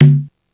CONGA, M1 DRUMS; East West; Global Sounds

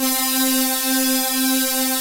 PWM PADS; Pro-Rec - Synthsonic; TR PADS

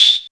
technox perc; Quasimidi Technox

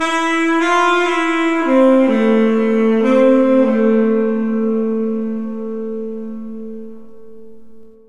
Sax Effect; Effected; Sonic Foundry (Sony Creative Software); Jazz Solos and Sections

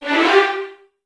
VN FX, Virtuoso Strings; ILIO; Kirk Hunter Virtuoso Strings VOL-1

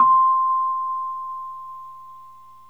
Rhodes C; 3D Sound; 3D Keys VOL-1

